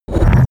mechanical switch stone large push

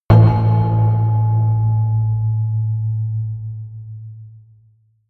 sub metal impact, hits impacts

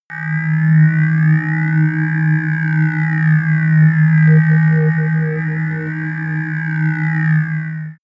sci-fi ambient scanner medium, drones

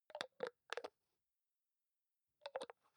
hygiene small cup close soft; haus-garten; badezimmer

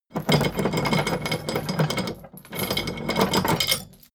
drawbridge winch hoist erratic; soundpool

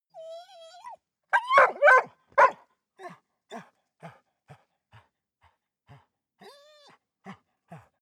dog bark pant; natur-tiere; hunde

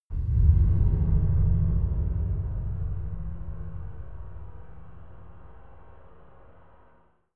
scary metal chime; impacts

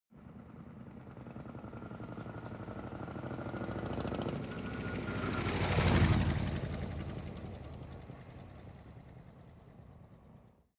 motorcycle harley drive by slow

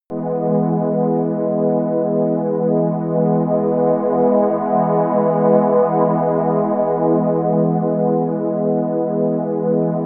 relaxing energy, music elements

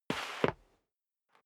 step sneaker concrete scuff; schritte

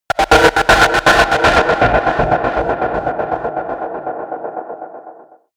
distorted noise arp; whooshes rises; hits impacts

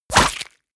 bullet small impact body flesh; krieg-waffen; geschoss

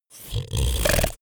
monster snoring short

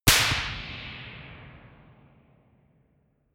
gun slapping pop gun

